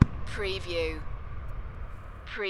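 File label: Runway Warning Shot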